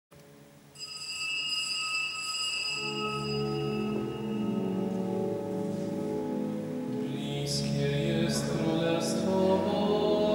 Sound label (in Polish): organy Katedra Frombork; Religijne